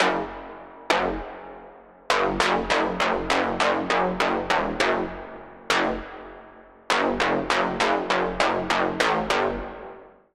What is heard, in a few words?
Pluck, Sylenth1; Routed Sound; Sylenth1 Expansion - Doom Carpenter Vol.1